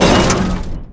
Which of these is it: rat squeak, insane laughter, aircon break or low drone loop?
aircon break